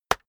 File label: keyboard external enter hit push